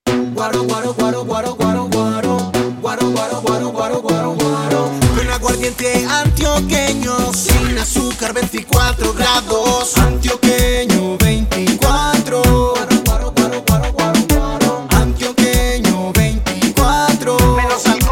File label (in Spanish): Jingle; Guaro 24o